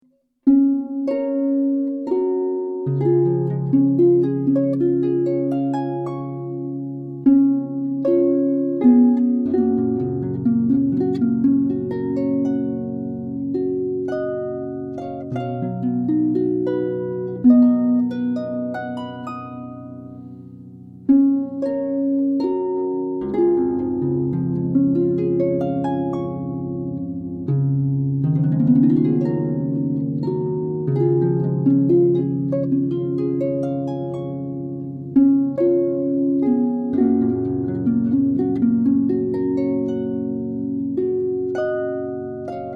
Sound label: Harp